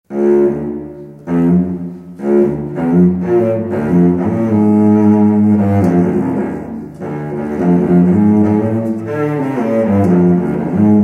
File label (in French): jazz quatuor